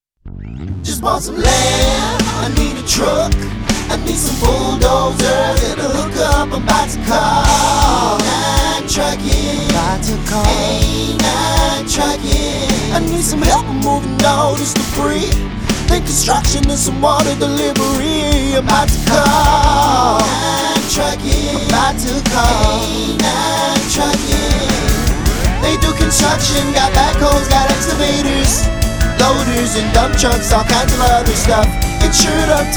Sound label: Trucking Jingle